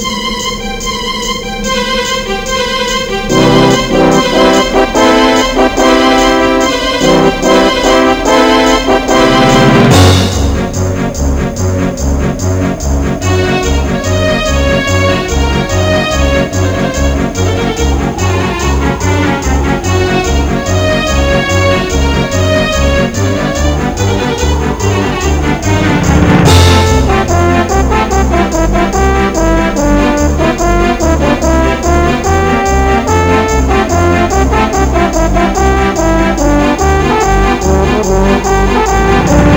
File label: xmas; ze freezy xmas